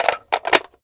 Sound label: Reloading begin; 完善武器音效; DungeonShooting; Godot